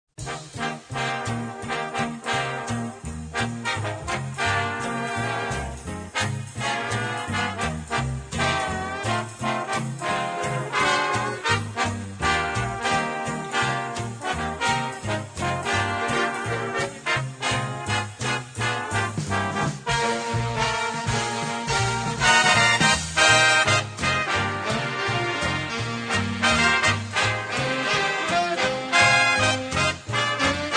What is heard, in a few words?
Jazzy Jingle Bells Instrumental